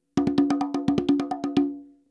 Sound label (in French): frise, congas